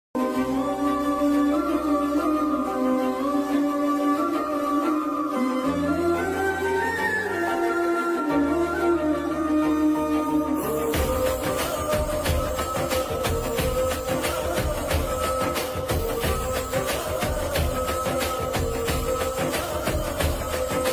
Emotional BGM Ringtone

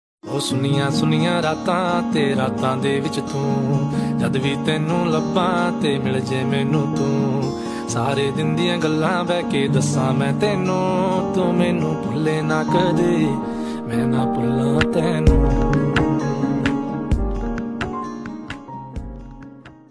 cute punjabi ringtone

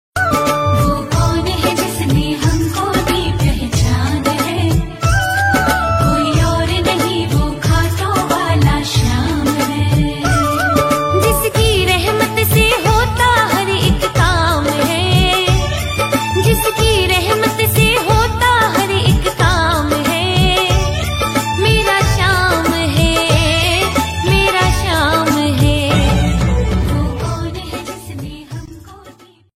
Khatu Shyam Ki Ringtone

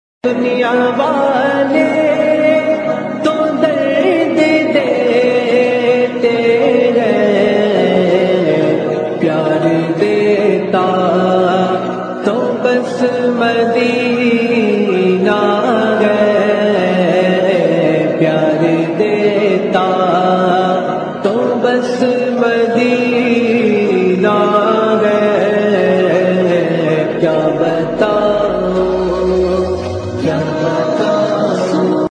Islamic Mobile naat Ringtone

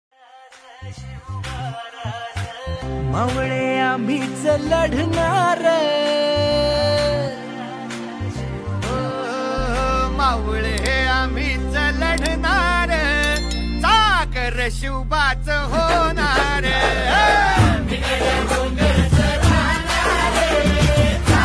ringtone

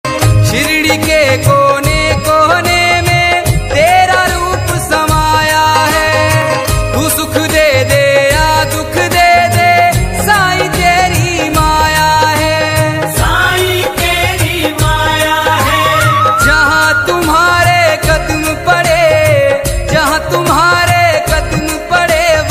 sai baba bhakti ringtone

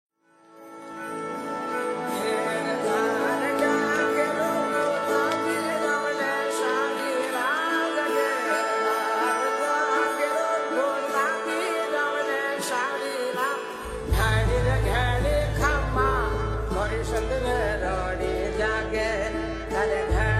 ringtone

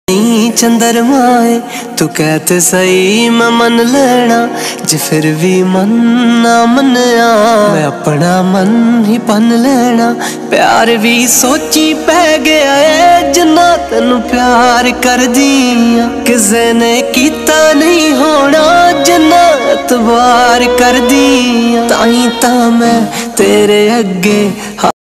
old heart touching ringtone

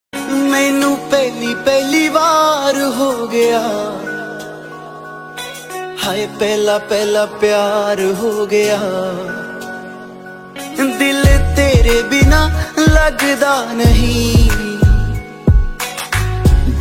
Song Ringtone

